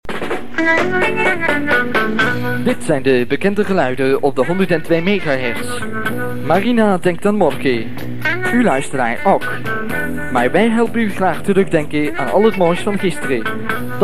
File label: jingle; Marina Gent